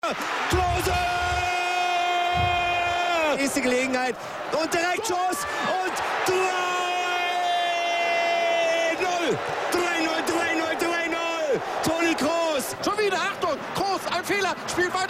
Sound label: radio